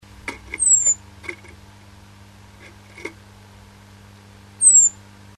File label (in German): nestbau rufe, nistkasten